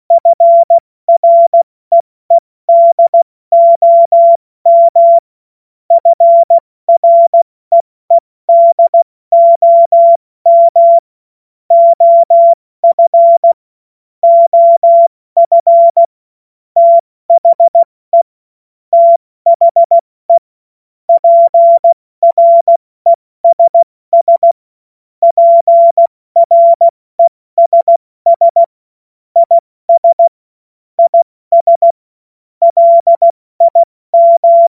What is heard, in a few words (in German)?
Witz, Morsekurs, 70 F80; Witze